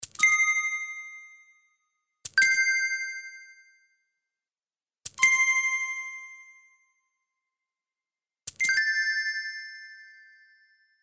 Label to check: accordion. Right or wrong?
Wrong